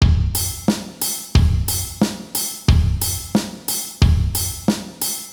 Rythme

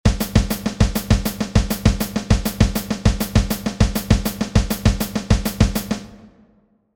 pied 2 main 1 100 bpm

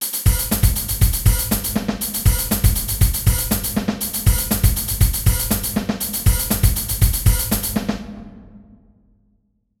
Rythme 100-120 bpm

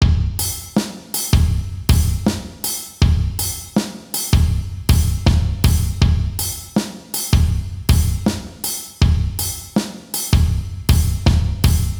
contre temps rythme 80 bpm

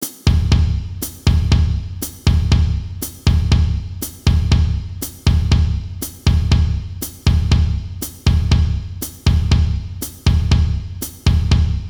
Samba 60 bpm

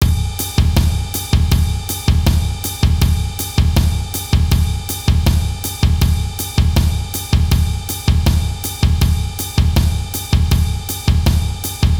Samba intermediaire caisse claire

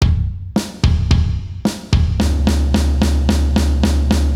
Rythme

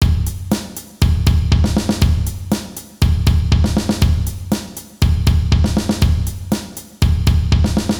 Rythme

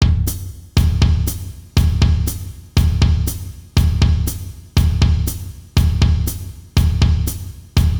Baiao exo 60 bpm

